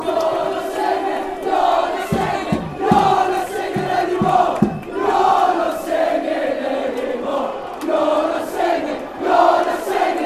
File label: youre not singing anymore fanchants